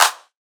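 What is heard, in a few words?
clap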